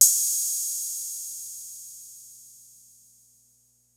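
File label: HIPHOP KIT, DRUMS; Kid Nepro; Elite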